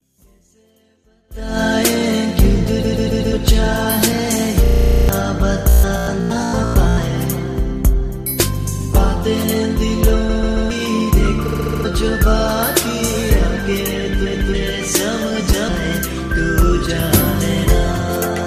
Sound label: samsung ringtone